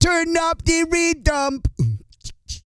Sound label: REGGAE, Vocal Planet World Voices